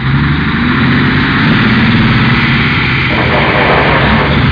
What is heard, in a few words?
drive; Softdisk for Windows 46